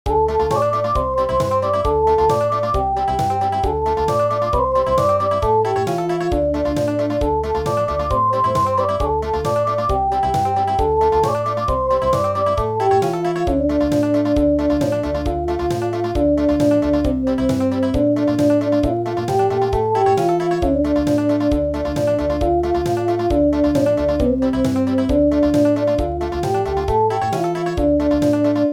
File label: Country